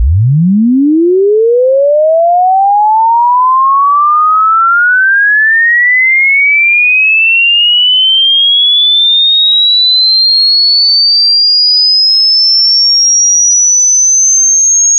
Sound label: tone 6000